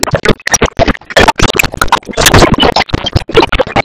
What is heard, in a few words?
Object Temple Defense Wave Clear